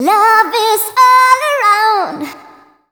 Dance Vocals, FEMALE

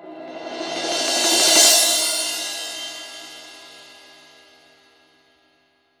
cymbal swell; Voice Crystal - Steve Reid Definitive Percussion

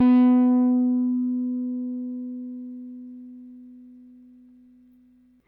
RickThumb-B, RickenbackerBass; Airwindows

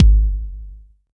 kick, Roland R-70, BD

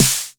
Snare TR-909 Unprocessed; MACHINES 666

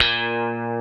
FRET, Classic Guitars; E-MU Formula 4000 Series Vol. 3 – Analog Odyssey

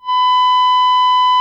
GTR, GUITAR FX

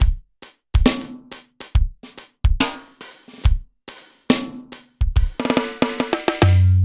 Stagger Loop, Drums & Percussion, Acoustic; Electronic Point Blank

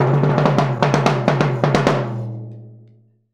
Timbale Fill; Sonic Foundry (Sony Creative Software) - Essential Percussion

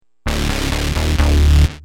Heavy, Bass; Industrial

